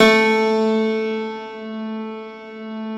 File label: A1, Classical Piano ff +ped; PS-53 Total Piano; Brilliand Classical Piano